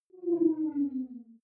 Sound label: wobble drop high